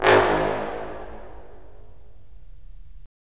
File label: BRS, Roland LA Composer